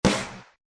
Thrash Snare, DrumKit; REAL LIVE KITS; Osama Qureshi DrumKit

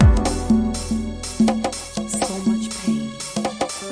122 bpm smooth house, drum loop; 121 to 130 bpm

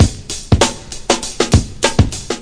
99 bpm four star popping, drum loops and breaks; 091 to 100 bpm

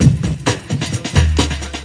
130 bpm rolls, drum loop; 121 to 130 bpm

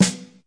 natural sd, Snares; single hits

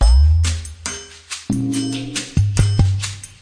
mega wave drum, drum loops and breaks, 070 bpm; 000 to 080 bpm

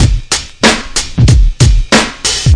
crunchy hiphop, drum loops and breaks, 94 bpm; 091 to 100 bpm

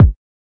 kick

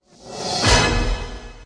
suspense movie punch; Punches Hits Discoblasts; single hits

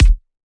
respect kick; Kicks; single hits

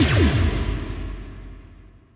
Electro FX; Ueb HE Electro FX

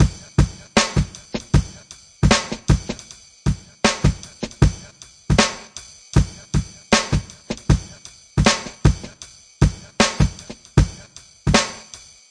078 drunken stagger beat, DRUM LOOPS and BREAKS

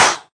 CLAP, Volca; WallsVado Volca Sample Pack

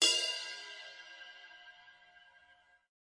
Gothic Ride, DRUMS, REAL LIVE KITS; Osama Qureshi DrumKit

